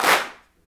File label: CLAPS, Africa Handclaps